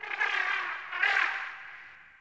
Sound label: Venus Landscape Mid Creatures, Ambience; Warframe; Venus Open World